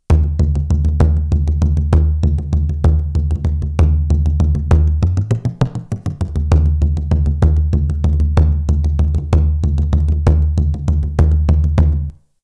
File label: bodran, Bodhran im Mix